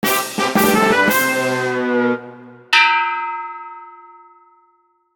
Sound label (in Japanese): 失敗のアイキャッチ